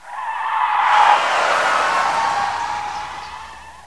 SKID LONG; Andretti

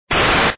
thrust; thrust 2

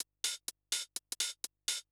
HIHAT HOUSE 125, Drum; eJay

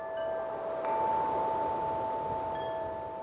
chimes

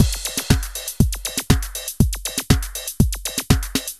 storm loop; maudio; Elektron

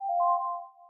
Message In; Gizmo; interface sounds

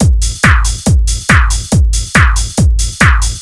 ibiza, Techno Drums; TechnoMusicMaker

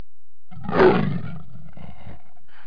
leopard

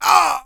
human hit; deaths; starship trooper

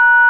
tone

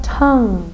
tongue

